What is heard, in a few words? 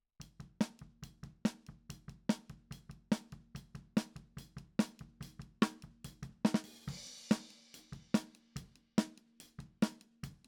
Snare Top